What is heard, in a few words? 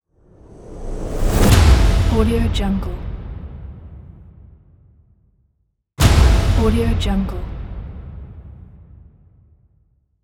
cinematic whoosh hit